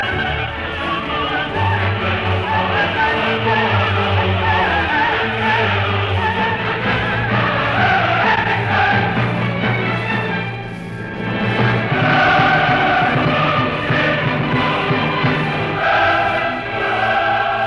Symphony in D minor Choral Finale Allegro ma non tanto; Symphony No 9; Op 125; Arturo Toscanini